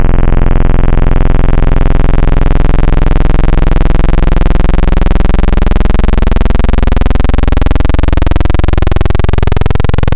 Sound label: Analog PWM Custom